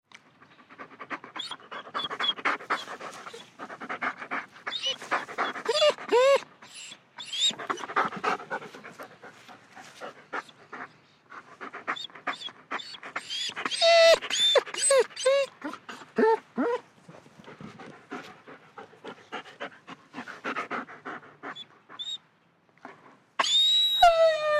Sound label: Dog German Shepherd Pants Whines Moves; Dogs; Animals; BigRoomSound